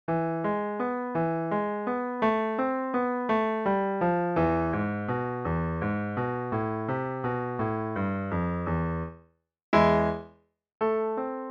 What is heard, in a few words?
malaguena piano